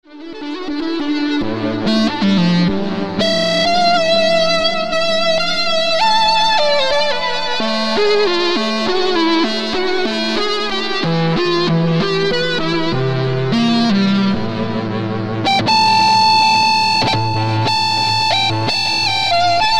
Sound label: Lead; Cosmology